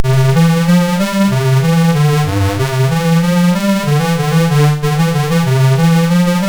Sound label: MOS6581R3, sid; Omidar